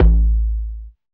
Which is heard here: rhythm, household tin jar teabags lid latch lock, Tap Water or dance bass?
dance bass